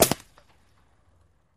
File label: Bullet Ricochet Sin